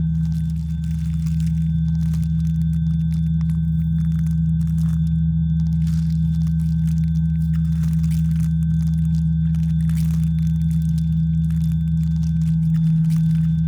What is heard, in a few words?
pro ship science idle; biogenesis